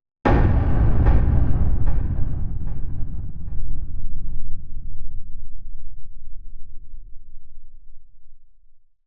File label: Tension Builder Impact